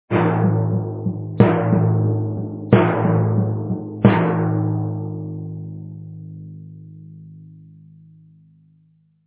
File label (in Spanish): tambor tribal